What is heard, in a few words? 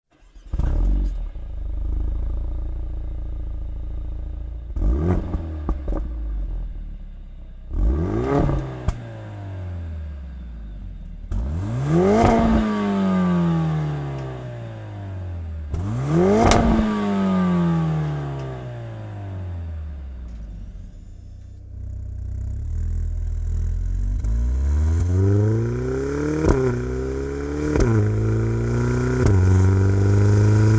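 MERCEDES AMG A45 W177, exhaust; EVOLUTION LINE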